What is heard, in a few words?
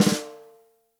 ROCK SNARE CHROMATIC, Real Drum Kits